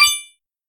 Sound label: collect